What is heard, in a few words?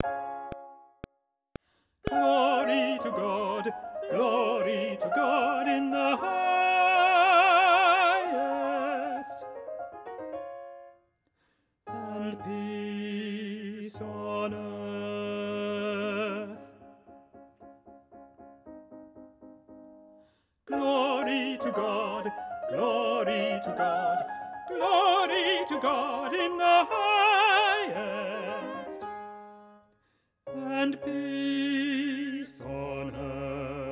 Glory To God TENOR